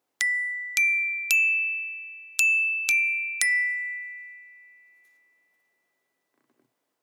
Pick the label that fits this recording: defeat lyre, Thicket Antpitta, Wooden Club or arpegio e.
Wooden Club